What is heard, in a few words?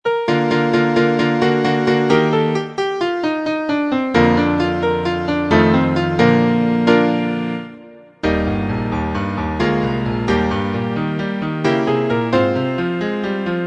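accompaniments, Trumpet in Eb; concone; Rudimentary Transposition Studies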